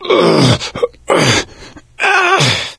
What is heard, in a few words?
choke, gambler, survivor voice; source engine; left 4 dead 2